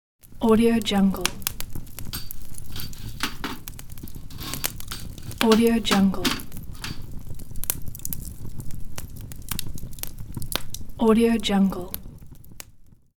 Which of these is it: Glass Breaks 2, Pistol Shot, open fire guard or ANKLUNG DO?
open fire guard